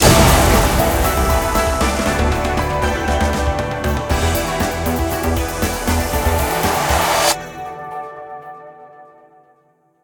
big Win Ambience; netent; starburst

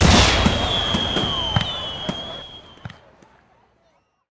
bigwin start; netent; copycats

